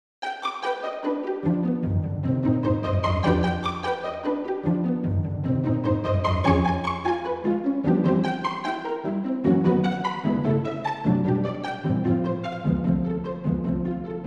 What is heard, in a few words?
pizz strings; kontakt player; sibelius